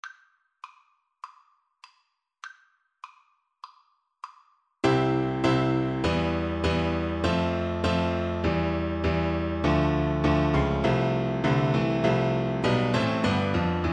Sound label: Piano Duet